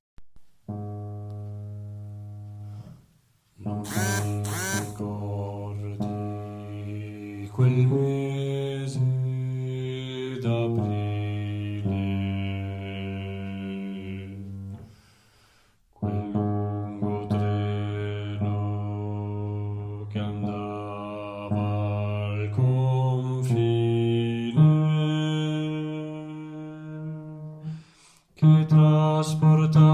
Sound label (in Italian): Monte Canino bassi